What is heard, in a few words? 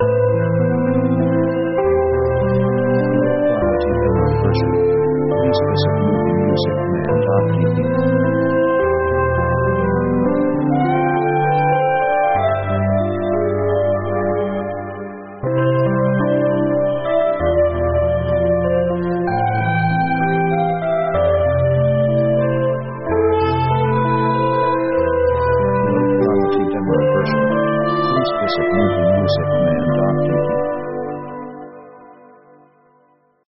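synth orchestra american waltz; synth themes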